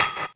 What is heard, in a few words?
Snares, Elektron MachineDrum SPS1 MKII